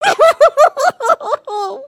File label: fear; emotes